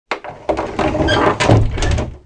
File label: wood; Mafie 1